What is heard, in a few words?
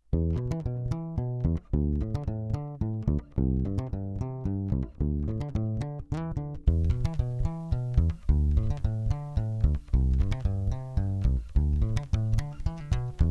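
Surfcaster soft Passiv dann Aktiv DI Preamp; Charvel; Avalon via Tuner Out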